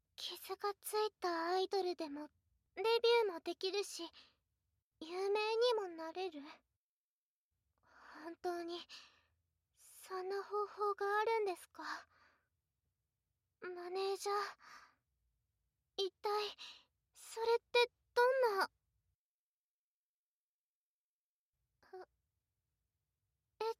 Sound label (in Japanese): オナニーフェラ(SE); 美鈴